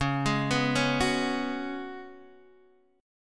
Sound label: C sharp 7th Suspended 4th, C sharp, down, Guitar, DADGAD